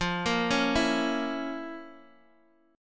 F Major 7th Suspended 4th Sharp 5th F, Guitar Standard; FM7sus4#5 arpeggiated; JGuitar